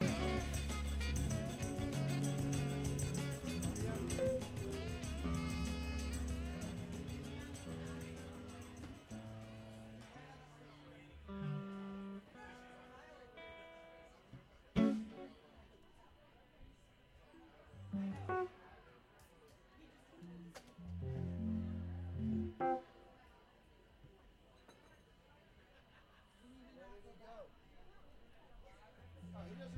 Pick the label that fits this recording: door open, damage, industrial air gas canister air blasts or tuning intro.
tuning intro